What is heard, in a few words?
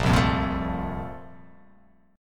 A sharp Major 7th Suspended 2nd, down